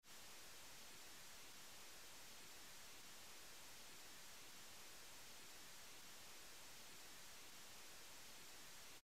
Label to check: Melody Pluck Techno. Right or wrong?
Wrong